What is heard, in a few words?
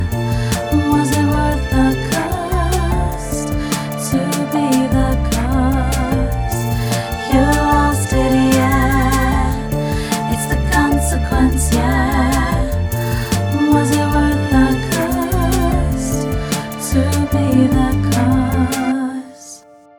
outro no adlibs